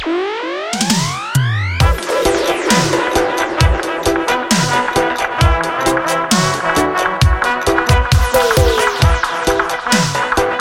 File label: Jahtari